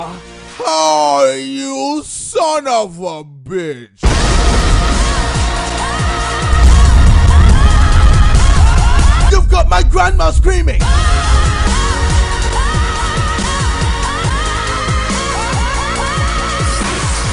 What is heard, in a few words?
Grandma Screaming